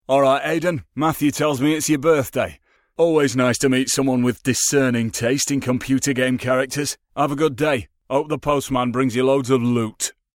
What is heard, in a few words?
birthday shout; Hoxton